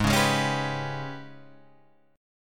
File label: Ab Minor Major 7th Double Flat 5th, down, Guitar, Open G